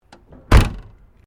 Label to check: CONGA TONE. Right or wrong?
Wrong